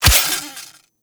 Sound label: Melee Sword Impact Robotic Flesh; Weapons; Swords